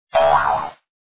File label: boing